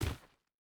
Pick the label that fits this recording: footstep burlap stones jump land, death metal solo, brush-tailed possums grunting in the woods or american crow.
footstep burlap stones jump land